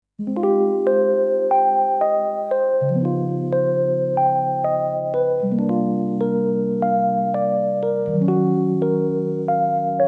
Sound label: bar chill lofi